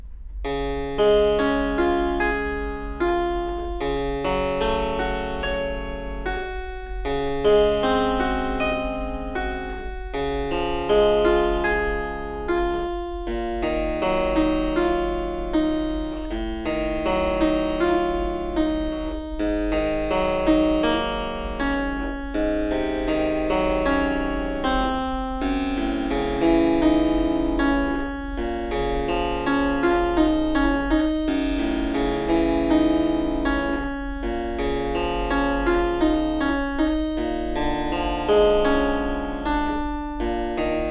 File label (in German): clavier des dur